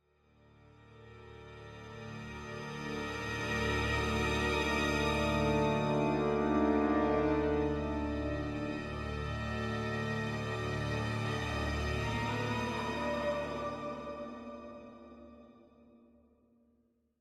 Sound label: Spooky Ship Mood